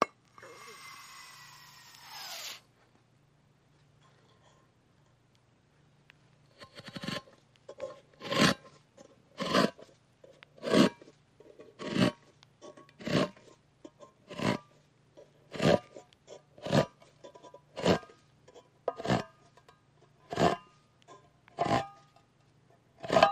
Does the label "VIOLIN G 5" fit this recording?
No